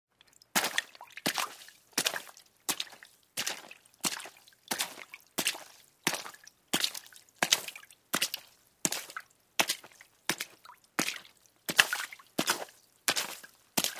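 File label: sounds of human footsteps in water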